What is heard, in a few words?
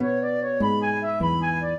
minuet, flute harp; Mozart